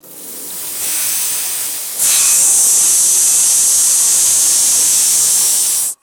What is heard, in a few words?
continuous high pressure air release